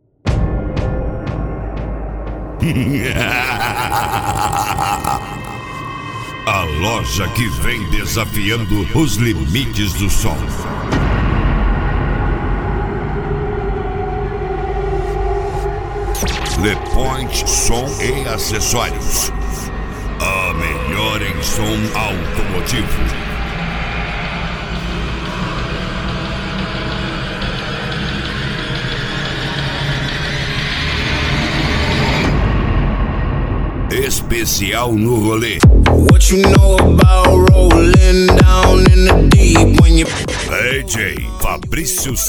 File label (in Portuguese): deep house e pisadinha; le pointe som e acessorios; funk